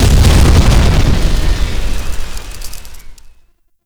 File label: Shock Rifle Alt Explosion; UT Weapons